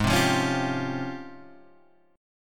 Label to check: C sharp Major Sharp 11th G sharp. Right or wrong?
Right